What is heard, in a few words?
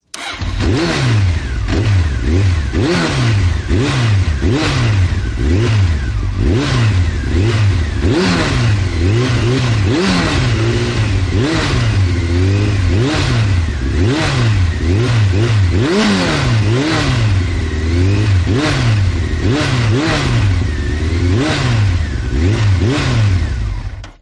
Bike Fx